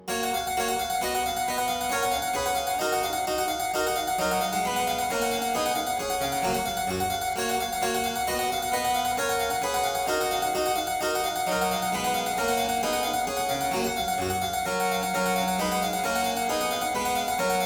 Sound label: Aria in G major Partita 23, Harpsichord